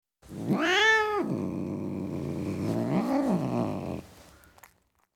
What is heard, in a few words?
Tom Cat Growling, Individual Grow; Cats; BBC Sound Effects Library